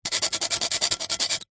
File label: foley stone or flat rock knife blade scrapes scratches fast; zapsplat